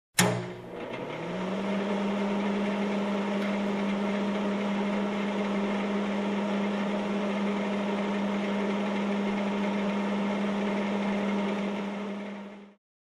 Construction

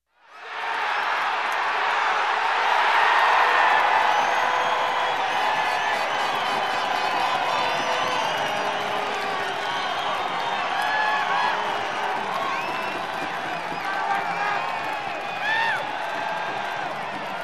Crowd in a game cheering and having fun

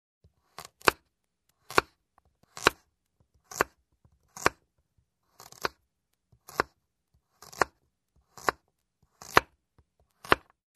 Slicing on a wooden board

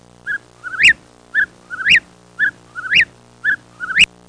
Miscellaneous bird chriping

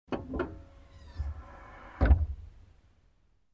Closing Trunk

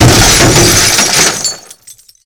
Huge glass breaking

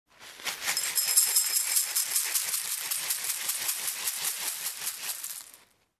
Put cornflakes into bowl

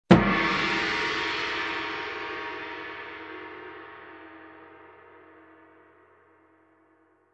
Gong hit

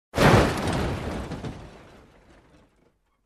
Wood Collision Sound

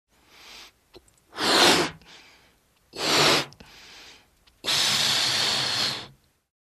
Inflating balloon

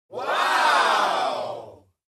Crowd Wow reaction

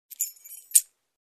Keys tinkling

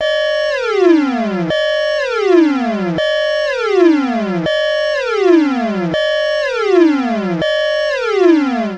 Headquarters Electronic Alarm

